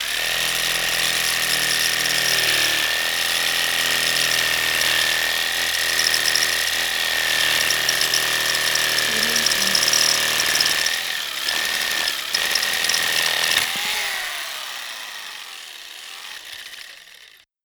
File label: electric jigsaw cutting wood